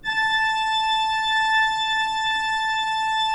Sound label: pipe organ, 3-D Audio